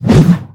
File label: slash